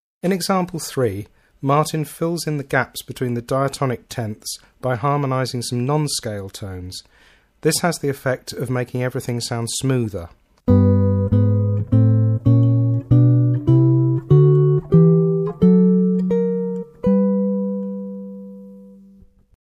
Adding Non Scale Tones, Guitar

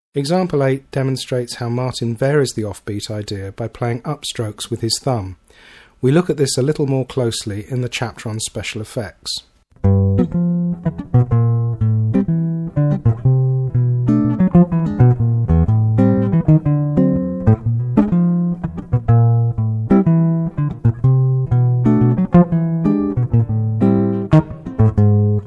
Offbeat Bassline Variation, Guitar

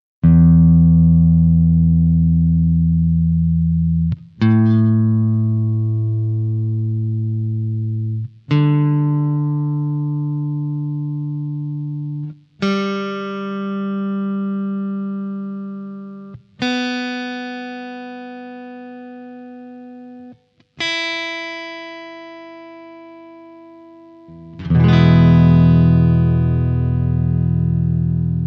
Tuning Notes, Guitar; Guitar Method

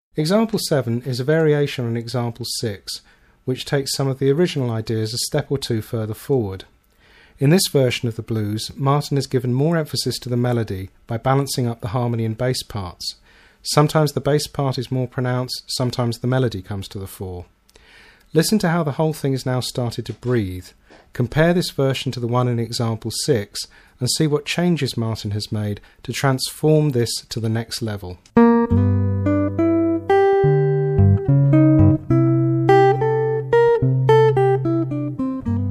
More Involved Blues Melody And Bassline, Guitar

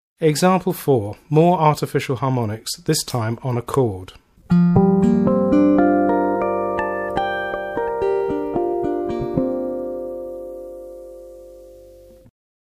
Artificial Harmonics Chordal, Guitar

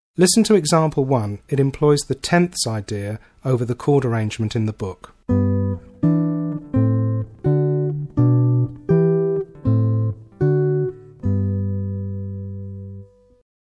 10ths Around Chord Arrangement, Guitar

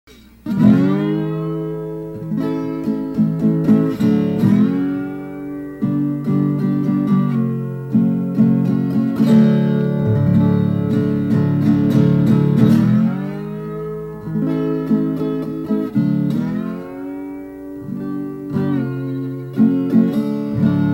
slide improvisation

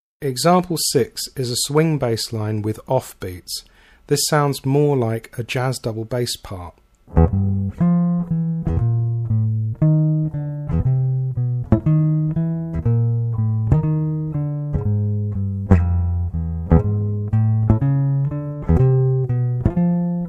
Swing Offbeat Bassline, Guitar

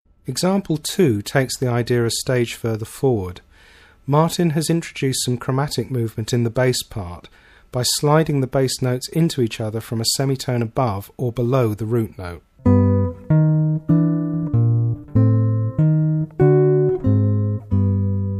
Chromatic Movement, Guitar

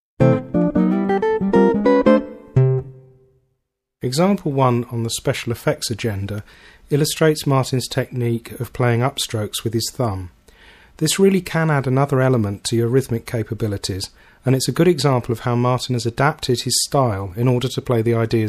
Thumb Upstrokes, Guitar

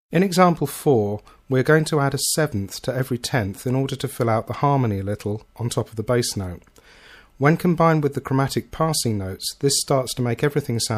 Adding the 7th, Guitar Method

